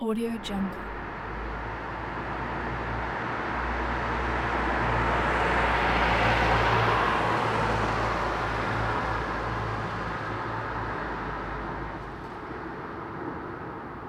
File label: city street slow car passby